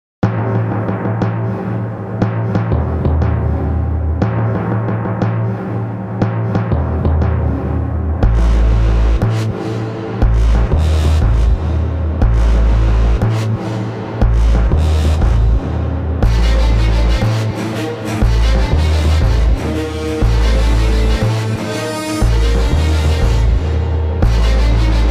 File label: timpani build up; short tutti